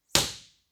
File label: IR